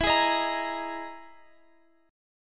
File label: E-Major Sharp 11th E, Guitar Open F; EM#11 strummed